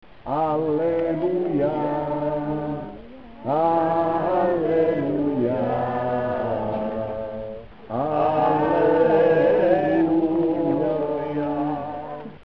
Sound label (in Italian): alleluja liturgico